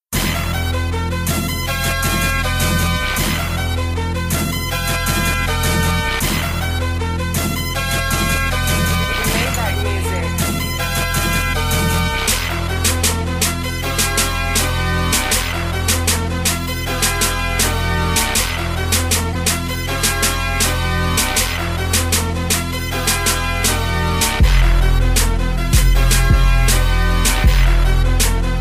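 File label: Instrumental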